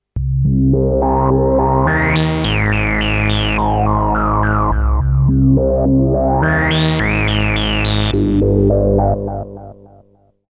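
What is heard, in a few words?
matrixbrute2 lfo filter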